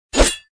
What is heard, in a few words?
bullet create; croods